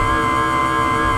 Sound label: Drop Hatch Loop; Doors